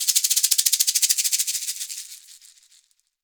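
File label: shaker, percussion; ILIO; Synclavier Percussion 2; World & Orchestral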